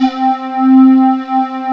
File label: SQUARE LD, JV-1080